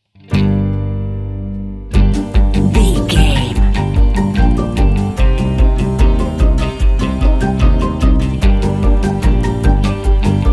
modern european folklore dance

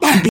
voice male hurt pain

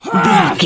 voice male attack

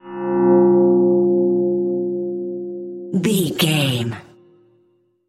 magic mirror spell tones

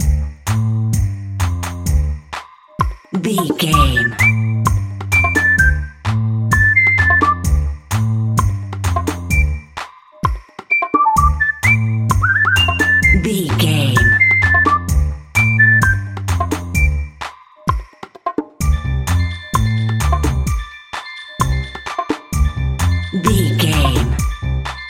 music fun funky whistle groove loop

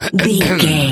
voice male coughing

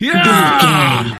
voice male battle shout

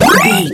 retro powerup collect

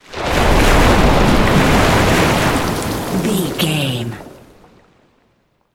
water spell wave crash

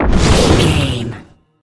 bullet leave barrel slow

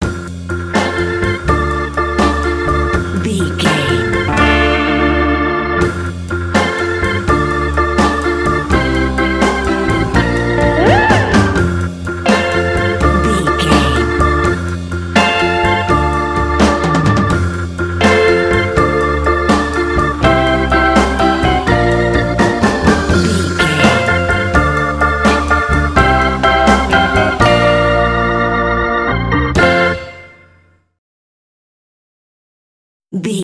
quirky happy song

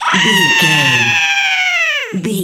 goblin fairy battle shout

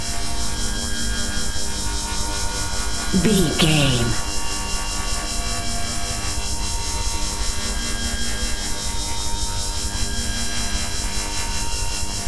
energy force spell loop

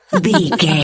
voice female laugh

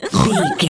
voice fun character vocal crazy hurt

